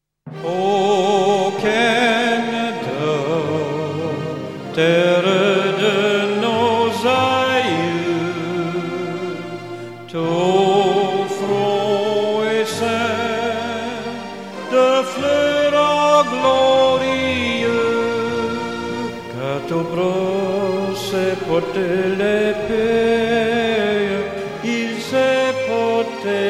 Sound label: o canada orchestra vocal french